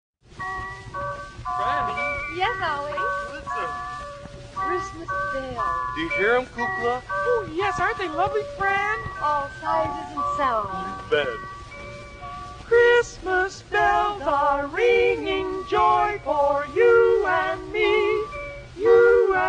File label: bells